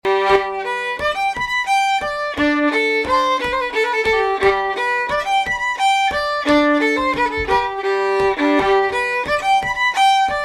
Polska fran Kungsviken (fast, slow); Bohuslan

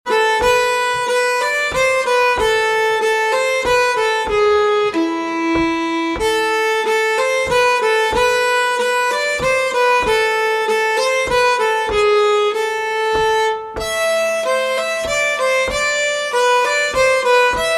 Polska; efter Johan Jakob Bruun; slangpolska southern

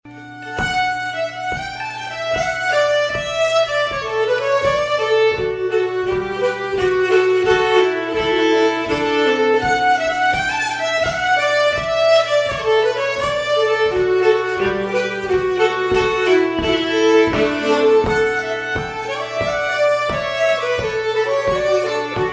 Polska fran Sormland; efter Pehr Ericsson (Leffler 53); slangpolska southern

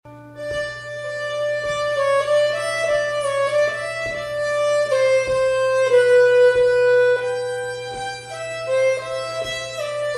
Polska (slow); efter Byss-Kalle; Uppland

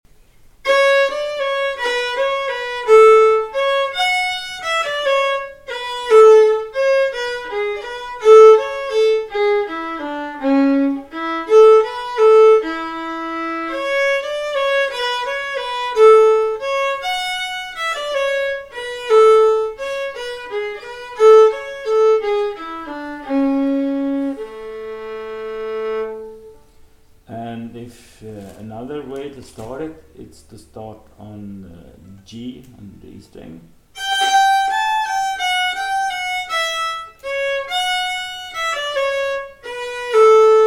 polska (harmony); Atta taktarn; efter Kristian Oskarsson; jamtland

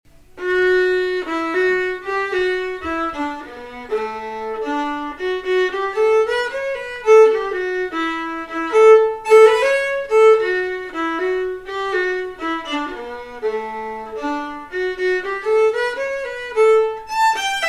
Brudpolska (harmony); efter Kristian Oskarsson; jamtland

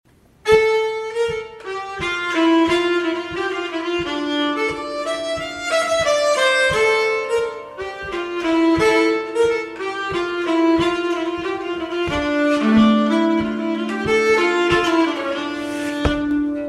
Polska fran Sormland; efter Pehr Ericsson (Leffler 89); slangpolska southern

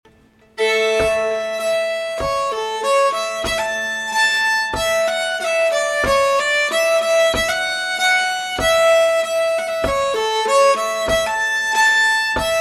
Bleking (slow); efter Carl Aron Hakberg; slangpolska southern

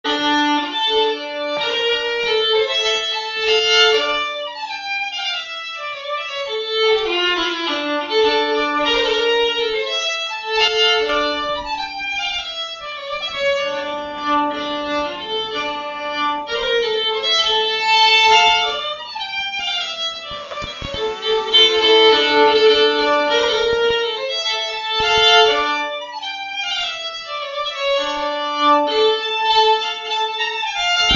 Polska; efter Olof Styrlander; slangpolska southern

